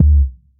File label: deep steel kick drum d key, kick single shot tuned to the D note